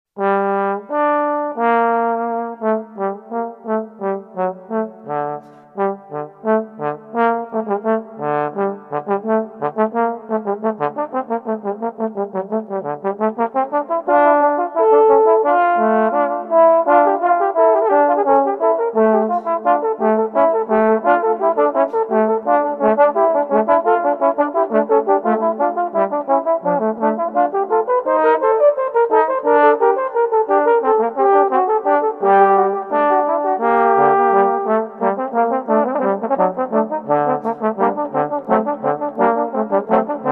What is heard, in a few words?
Fugue In G Minor